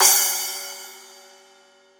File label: cymbal drum sample g key; Cymbal drum one shot samples